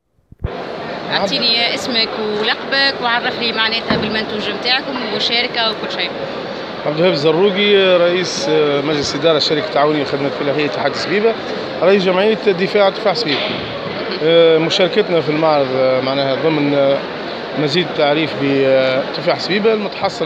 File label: Vocal